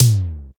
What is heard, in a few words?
high floor tom sample f sharp key; tom drum samples